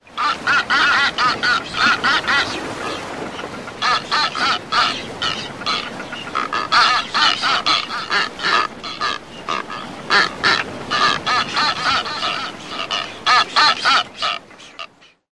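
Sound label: Blue-footed Booby aggressive calls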